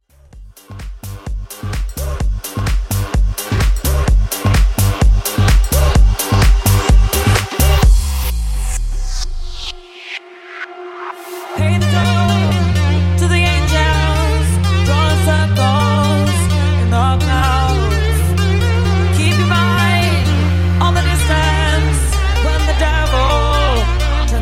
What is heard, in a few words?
Mashup, 128 bpm